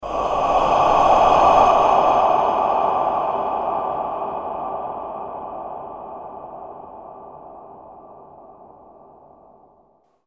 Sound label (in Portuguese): som de fantasmas efeito sonoro